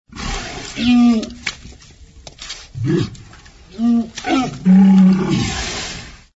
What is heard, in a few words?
duck; animals